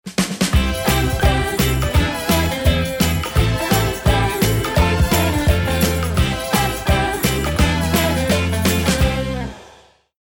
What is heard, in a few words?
Jingle Summer Pop